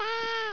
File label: sheep baa